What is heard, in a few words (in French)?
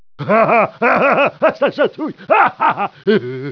chatouille